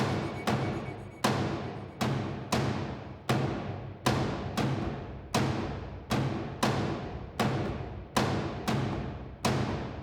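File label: Heavy Blades Elec Perc; Grineer; Grineer One B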